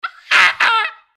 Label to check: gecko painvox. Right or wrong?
Right